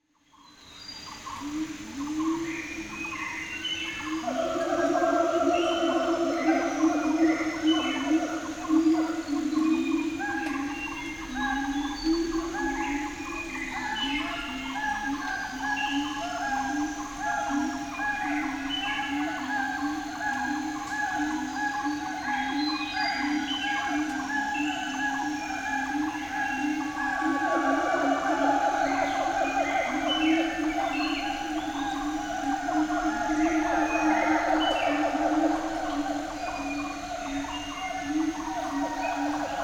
Siamang Siamangs Drongo; Old RD

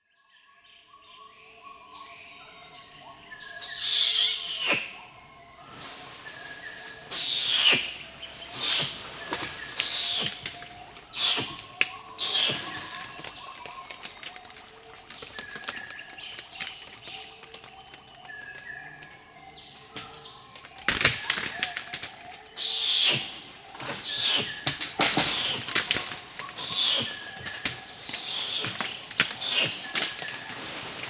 orangutan squeaking

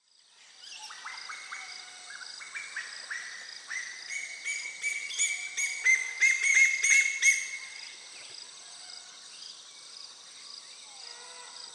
Pacific Koel Eudynamys orientalis cyanocephalus

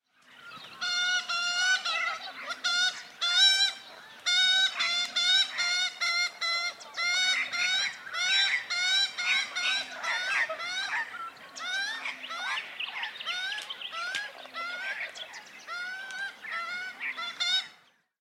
Australian Magpie Gymnorhina tibicen

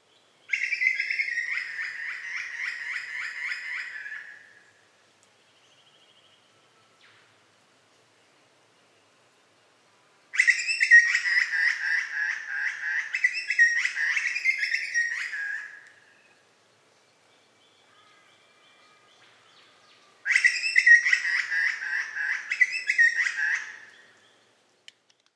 Grey Butcherbird

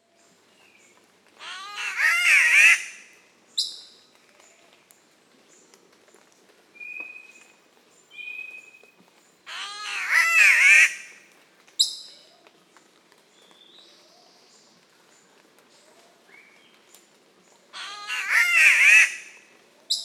Spotted Catbird Ailuroedus maculosus

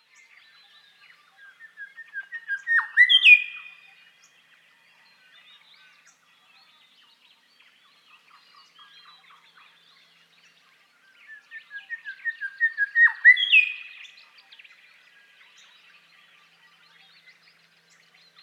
Grey Shrikethrush Colluricincla harmonica

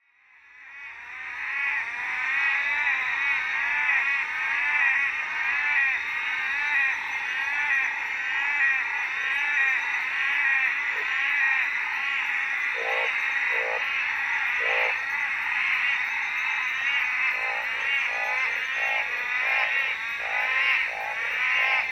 Black Mountain Night Treefrog

